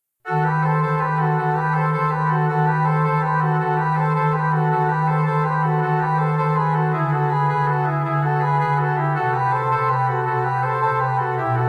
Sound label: Instrumental